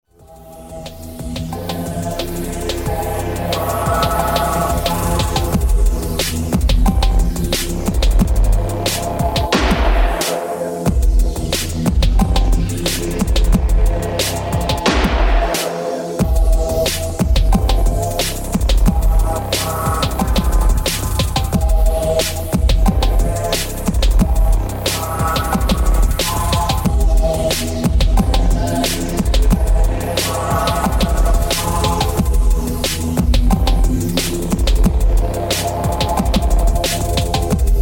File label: Super Beat Instrumental